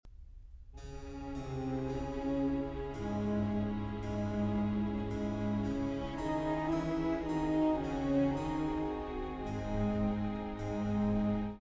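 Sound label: Bach Violin Concerto 2 II, Perfect intervals 5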